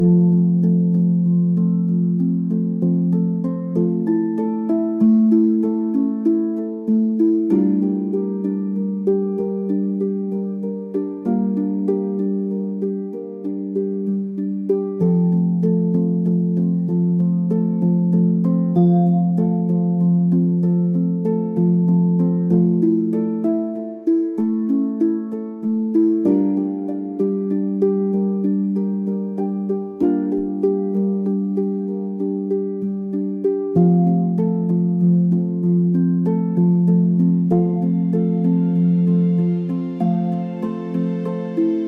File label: Meditation in Spring